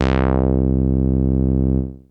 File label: Bass Synths hits and octaves